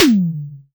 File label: Toms